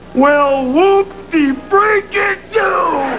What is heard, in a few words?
whooptie